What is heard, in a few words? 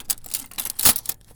Silverware; BB Retail Therapy Sample Pack